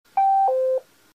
UK Police Bleep

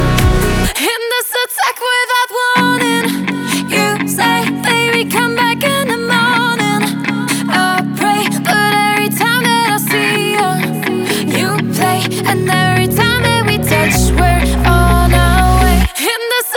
Violin

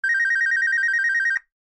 Htc

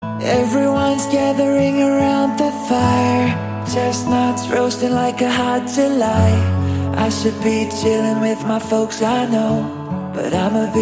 Acoustic

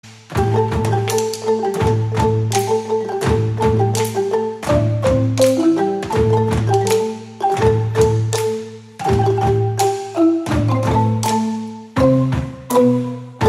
Marimba

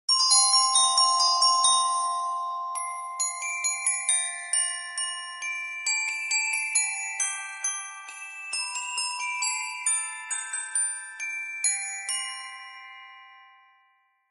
We Wish You A Merry Christmas Marimba

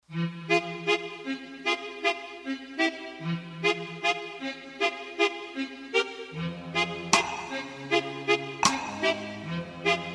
carousel theme; american horror story freak show

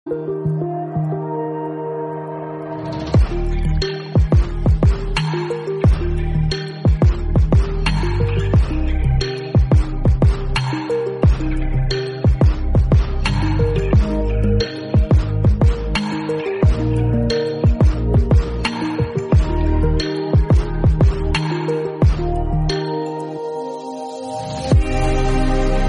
Instrumental

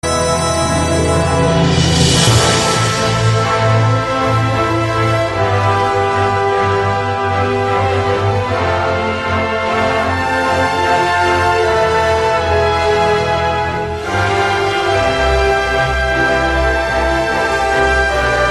Harmonica

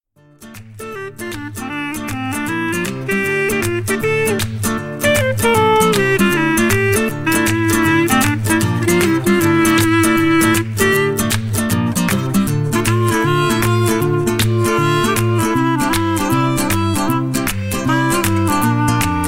Instrumental

